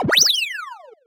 Red Coin Starter Start; SM3DL

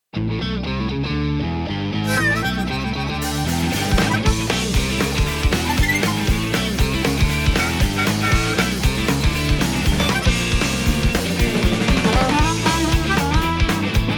Rock Arrangement; Steam Gardens

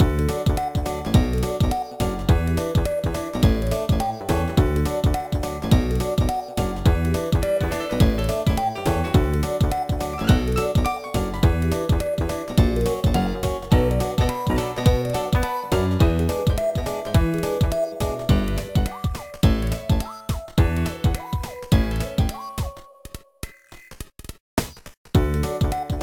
Pass, Photo booth music

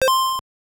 Coin; SML

